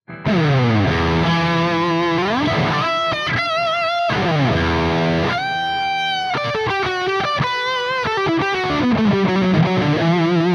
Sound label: Lead